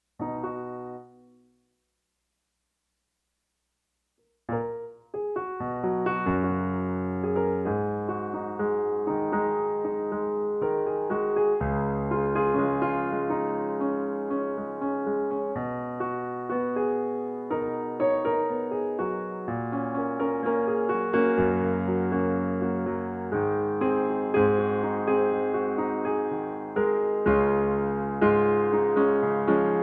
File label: Piano Cover